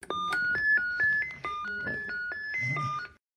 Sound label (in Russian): Звук открытия домофона Элтис; уведомление, стандартные, на смс, на уведомление, звуки, русские